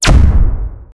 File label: short laser blast blue en; weapon fire